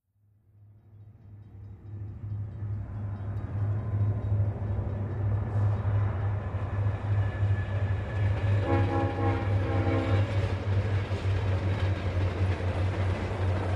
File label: Train By Med Speed, bys